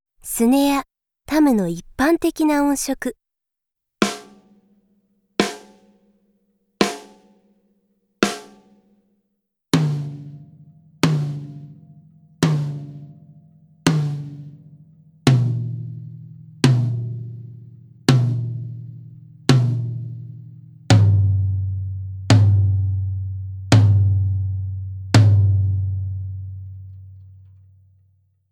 Snare & Tom Setting; Snare & Tom Ippan Teki na Setting; K-ON

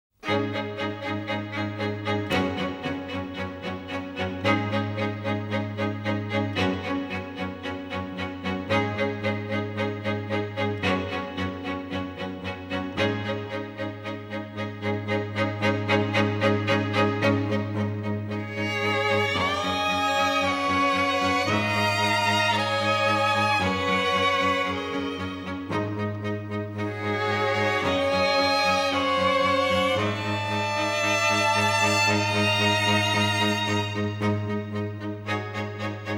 strings version; Neon Genesis Evangelion; Evangelion S2 Works

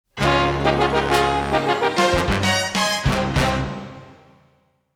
without narr; Neon Genesis Evangelion; Evangelion S2 Works

